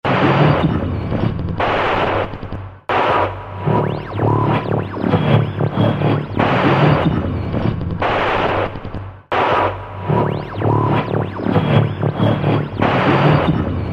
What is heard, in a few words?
Sci-fi Horror